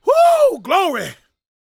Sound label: shouts, gospel preacher